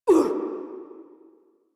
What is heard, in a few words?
Pain, Barks; Warframe; Corpus Lich; Persona D